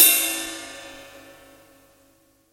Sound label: ride, drum; drum stick